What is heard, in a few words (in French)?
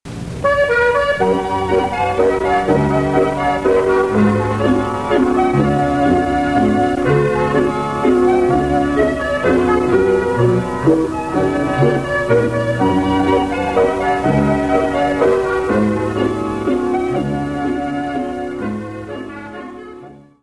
Kaiser Mazurka, Allemagne